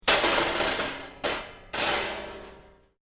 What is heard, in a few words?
Metal Drag Scrap Clanking; Metal rattles from dragging a metal bar across metal ridges; Lynne Music